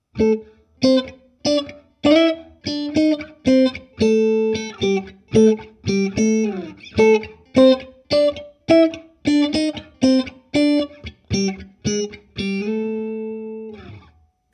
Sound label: Expressive Blues Guitar